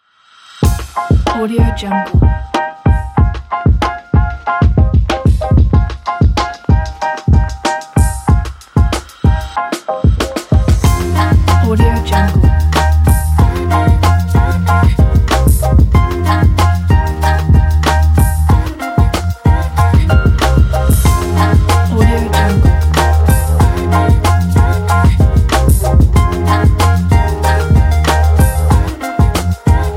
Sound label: hip hop groove